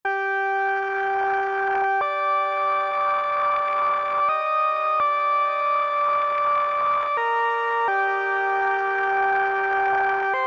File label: MS20 noise tail